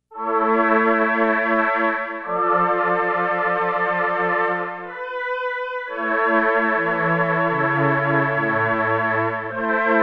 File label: Chorus